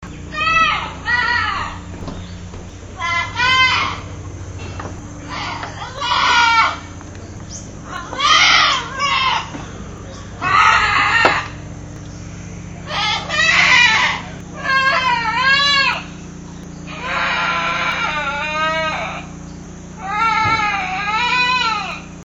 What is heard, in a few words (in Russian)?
popug; peru; amazon